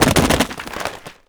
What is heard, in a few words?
bookcase collapse